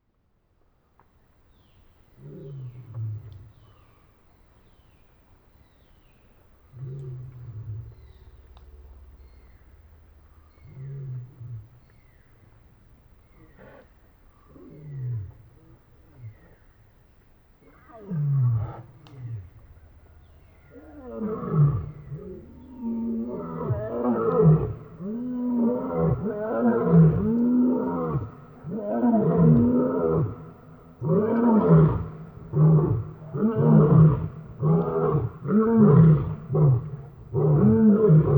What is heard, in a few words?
Lions dramatically roaring Botswana; wilderness songs